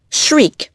shriek

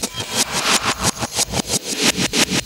HipHop Backbts; LiveAct Pool

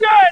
SHOUT

